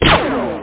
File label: FUSION